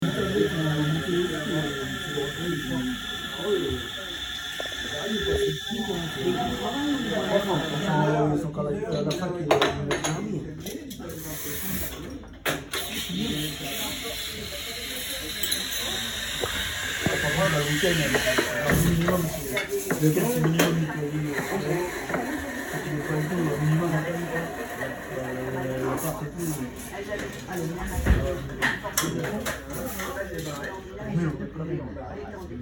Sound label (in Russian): zvuk franc kofeini